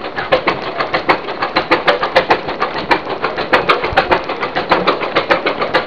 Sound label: avery, engines